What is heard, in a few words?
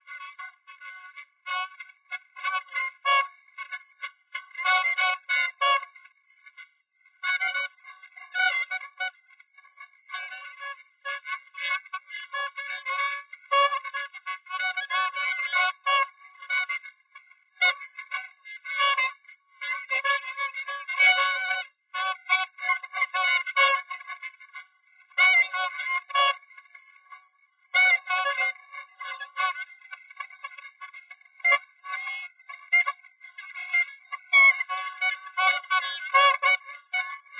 Jazz